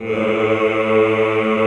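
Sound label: Choir A1, Ehh; Eeh + Ehh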